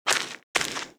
Walk

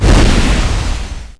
Stone Die

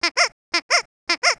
Pig run; PhilipPig; Seer; GamigoNA

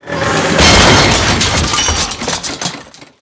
Prison Collapse; Seer; GamigoNA

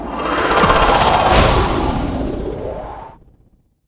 Sword Force; Seer; GamigoNA

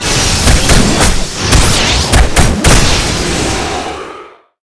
Power Weapon Blast; Seer; GamigoNA

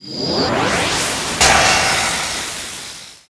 Lightning Wave; Seer; GamigoNA

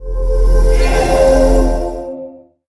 Mental Shout; QSkil

